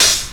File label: dampened open hi hat one shot d sharp key